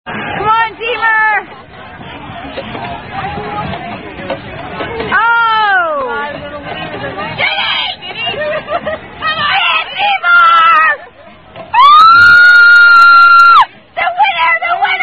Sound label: Flip Cup, Drinking Game; Survivor Flip Cup